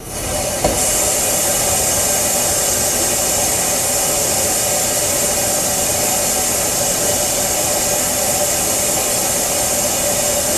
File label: Water Fountain